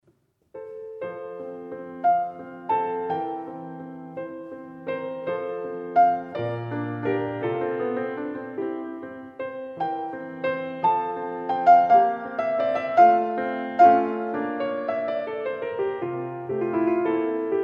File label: Sonatina, Sonatinas For Piano